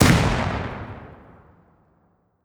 beancan grenade explosion; Rust

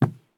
run wood; Escape from Tarkov

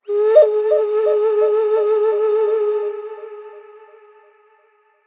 panflute; ambient; World of Tanks Blitz; map asia

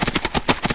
run; Rust

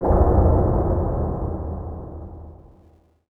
harbor occasional

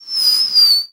atgm whistle; guns; tanks; War Thunder

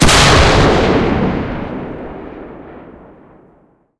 cannon 106mm m40a1c shot; guns; tanks; War Thunder

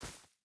footstep barefoot grass run; Rust

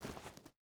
footstep hide stones walk; Rust

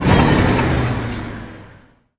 CH2 Engine Acc End; DCS

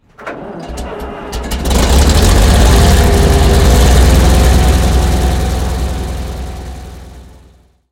hetzer engine start; War Thunder

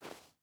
footstep barefoot snow walk; Rust

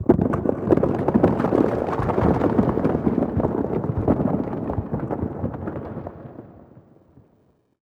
city occasional

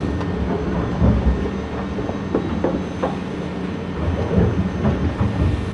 ambient port; World Of Warships; clanbase

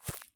sprint grass low; Escape from Tarkov

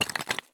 walk tile; Escape from Tarkov

